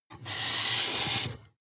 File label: marker mark soft